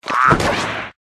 Pet Toad

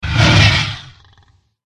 yasherogriffon attack voice bottom

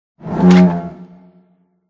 murraliy korr attack voice bottom

